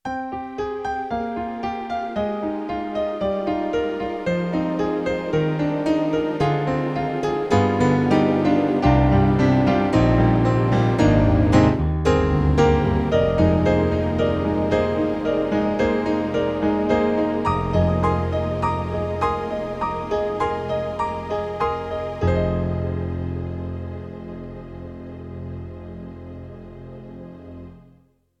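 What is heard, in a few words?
YAMAHA MOTIF-RACK ES, Piano and Strings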